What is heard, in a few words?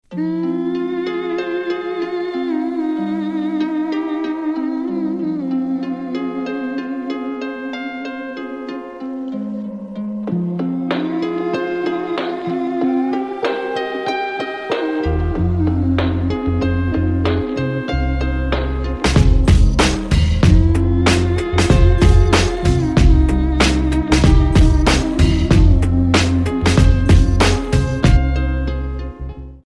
instrumental